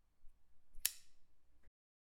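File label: Pocket Knife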